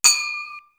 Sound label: FING CYMBAL 2; East West; Global Sounds